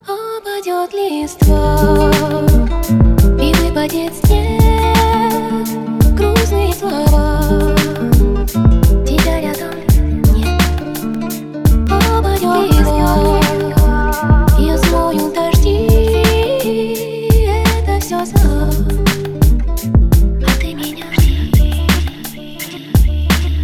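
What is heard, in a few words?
guitar with rain remix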